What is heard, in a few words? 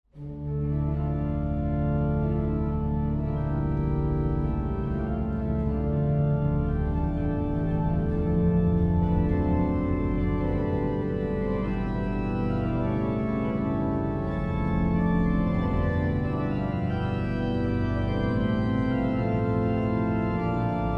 IMPRO fond d'orgue